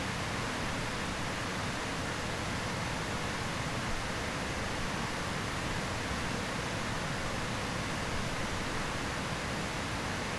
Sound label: falls